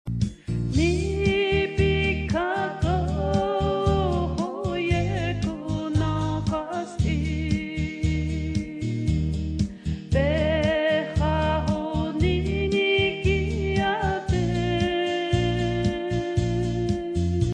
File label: navajo gospel ringtone